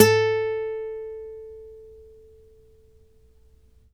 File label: ACC FG A, Acoustic Guitar; East West; Steve Stevens Guitar Samples Collection